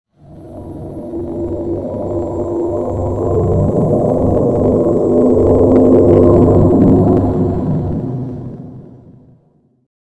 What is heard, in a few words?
alien